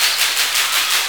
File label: applause; AKAI S6000 CD-ROM - Volume 6; Human